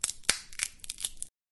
Cracking Peanut Shells, Cracking Peanuts Shell Sounds, peanut shells, cracking, crack, shells